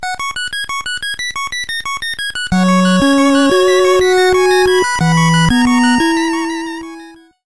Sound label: lawnmower flying away